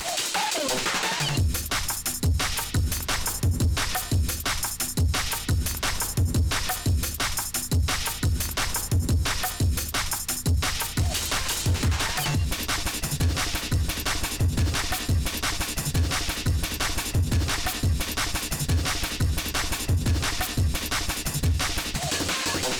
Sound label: drums